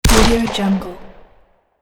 glock 9mm hand gun single shot; sound-ideas

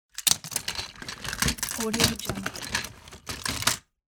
rummaging through toy box

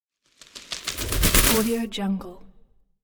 wings flapping; moonaudioproduction

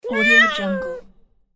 cat meow

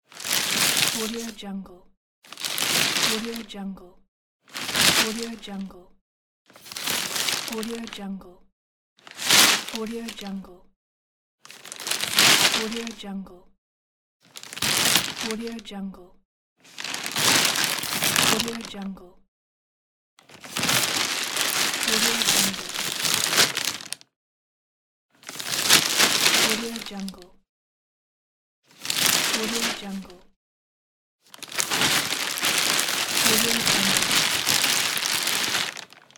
plastic bag

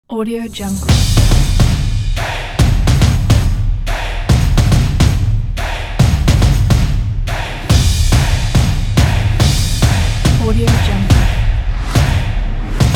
a percussion

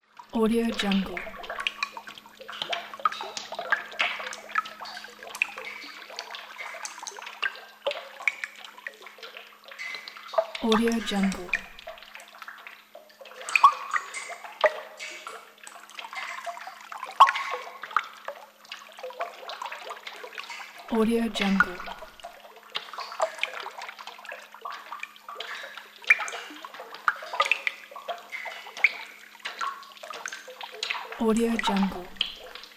water drops fall

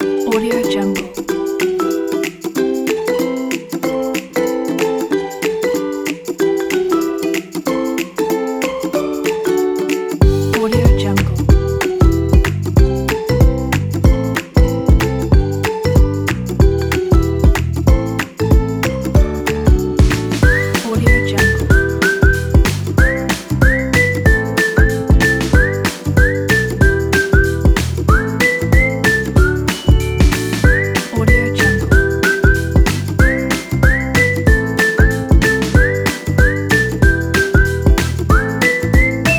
happy comedy kids

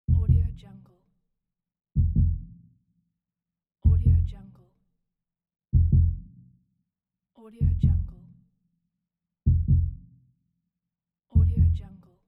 heartbeat

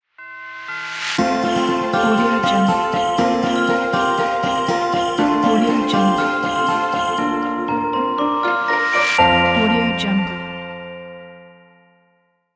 christmas ident

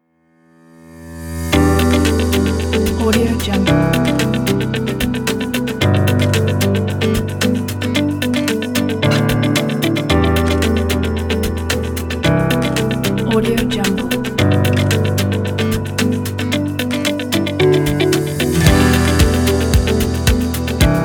the uplifting motivational

